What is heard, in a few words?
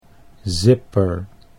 zipper